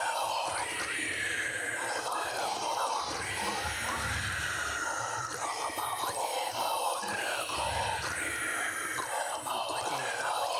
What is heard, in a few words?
Nightmare Crowd Idle Chant